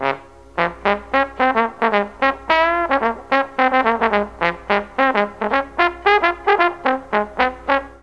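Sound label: F3 dixie trombone, mellotron; DIXIE+TRMBN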